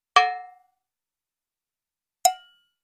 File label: Small Hollow Cowbel; chimes & bells